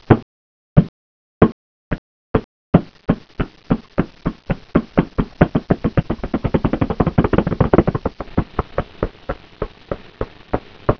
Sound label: Grouse; winter